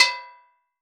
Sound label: Steel Drums Layer B5, Calypso Steel Drum; Percussion